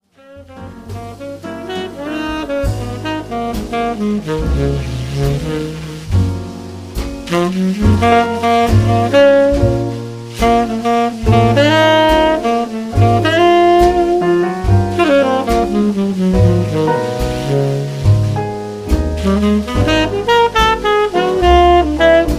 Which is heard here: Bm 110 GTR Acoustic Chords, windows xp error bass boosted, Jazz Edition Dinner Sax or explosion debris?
Jazz Edition Dinner Sax